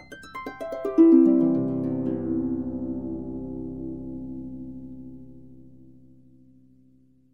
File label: harp down; Notes